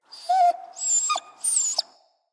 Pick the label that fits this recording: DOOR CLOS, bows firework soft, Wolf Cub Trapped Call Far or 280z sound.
Wolf Cub Trapped Call Far